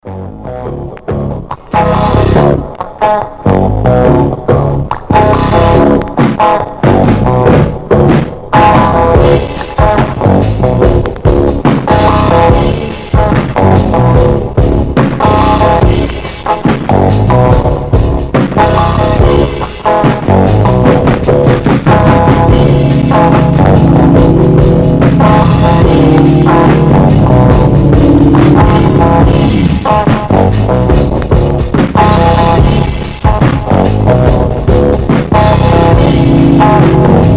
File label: funk; sask